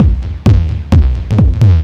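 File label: drum loop 130, left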